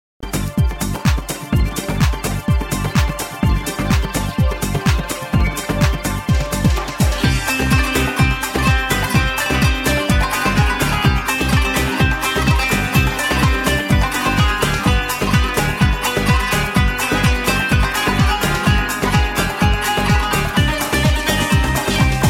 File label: Balkan Music